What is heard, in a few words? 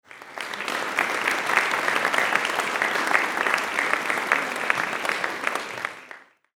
applause in a crowd